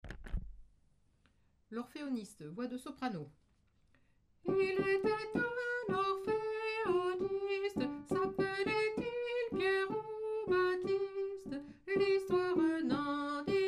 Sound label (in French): orpheoniste soprano